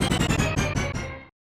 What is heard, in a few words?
Power Down; BS Zelda; SNES